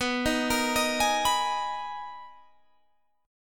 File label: B Major 13th B Guitar Open G